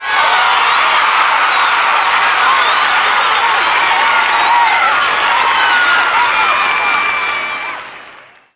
cheer, ambience; Birthday Party Bash; Wii; Spanish

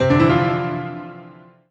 Solo Piano Positive; MUSIC EFFECT; startide

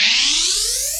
Pinball Charge Up; blastmorph pinball; xbox series xs

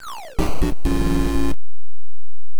Quick Draw Miss; Kirby's Adventure

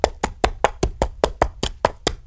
donkey trot; Birthday Party Bash; Wii; Spanish

